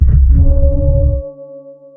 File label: sonar Ping Air Close; Barotrauma; Real Sonar